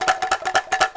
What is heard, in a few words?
F#4 anklung, Java anklung; WorldSounds